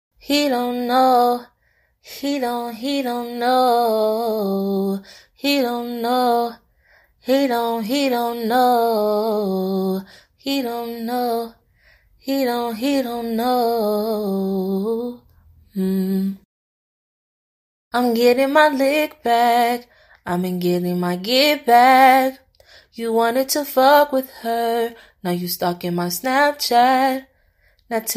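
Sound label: pop, 95, B flat major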